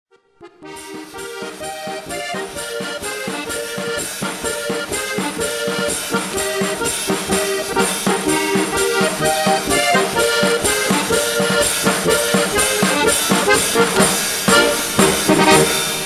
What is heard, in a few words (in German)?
Teufelsgeige